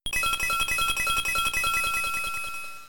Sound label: Tail Glow; Pokemon; Gen 3; attack moves; RSE